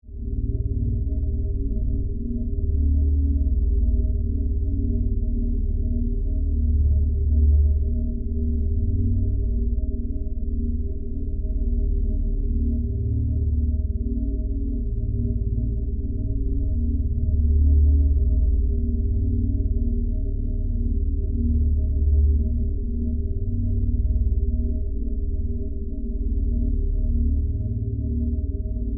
Drone Ethereal, Scifi, Drone, Ethereal, Peaceful, Science, Fiction